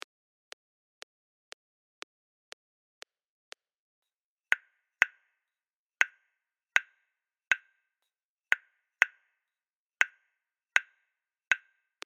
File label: Pilon 120bpm; Lateinamerika